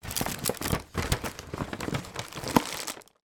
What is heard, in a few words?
foley box chocolates close; sound effects one